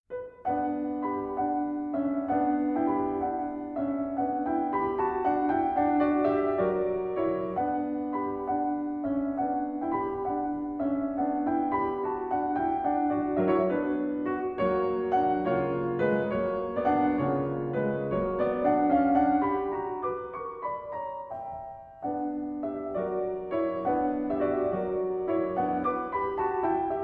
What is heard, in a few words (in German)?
sonate g-moll scherzo; Fanny Hensel